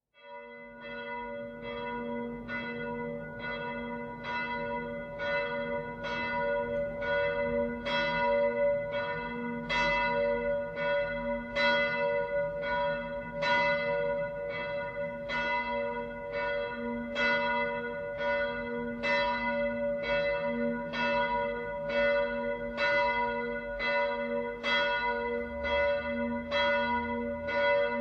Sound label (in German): Glocke h, Domglocken; Michael